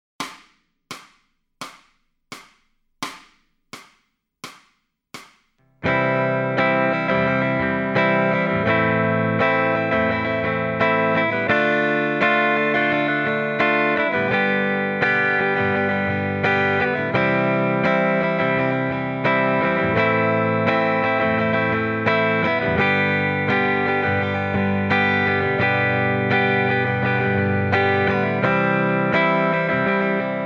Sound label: Lead Backing Track, electric guitar